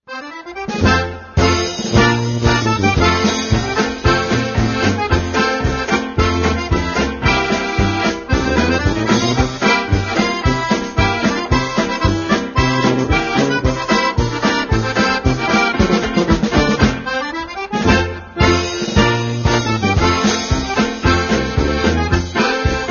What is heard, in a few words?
Cymbal